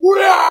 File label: scream 8-bit fight guy; ooh ahh